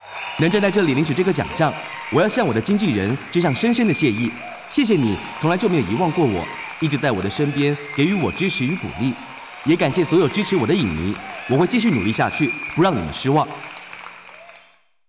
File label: music